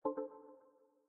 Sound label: activated, phone